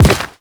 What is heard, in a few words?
Step Rock, Footsteps; Warframe; The New War; Characters; Kahl